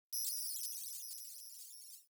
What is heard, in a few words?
Computer Room Ambient Beeping; Warframe; Ambience; Nineteen Ninety Nine